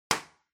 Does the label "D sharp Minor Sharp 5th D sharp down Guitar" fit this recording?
No